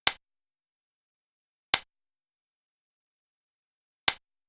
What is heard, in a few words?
gavel touch